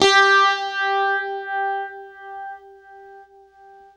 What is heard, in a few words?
UNIVIB G, Guitar; East West; Steve Stevens Guitar Samples Collection